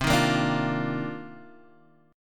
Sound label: C Minor 11th C Guitar Drop D